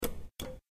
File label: vend mach button